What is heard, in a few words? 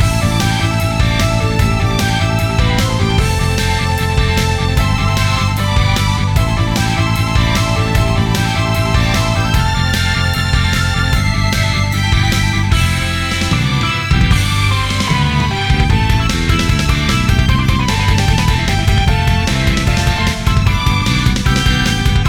Battle; Ember Kaboom